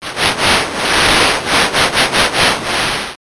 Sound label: river